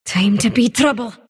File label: sylph move pain; dota; dark willow